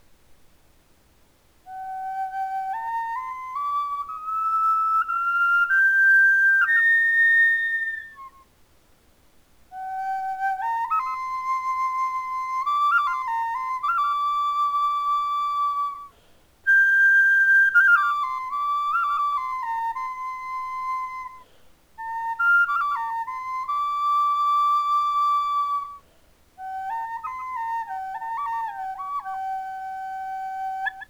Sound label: high G, flutes